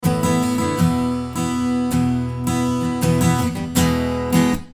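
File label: Acoustic Guitar Reflect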